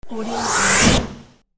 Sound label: wooshes conversion